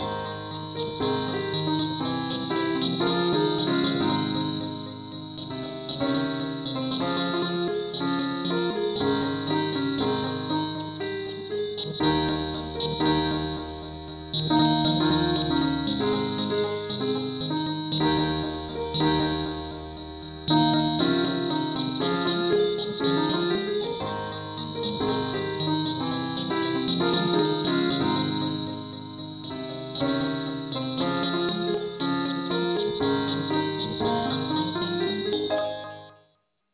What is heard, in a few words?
keyboard only key aes